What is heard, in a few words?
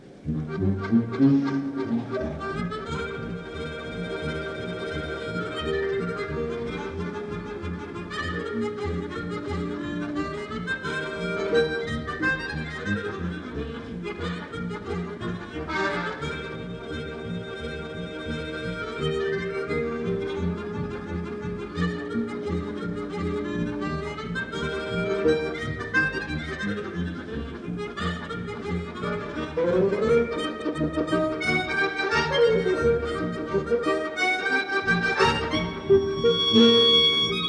Hora Staccato